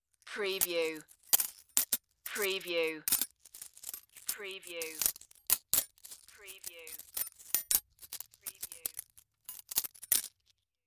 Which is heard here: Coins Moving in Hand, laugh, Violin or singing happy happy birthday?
Coins Moving in Hand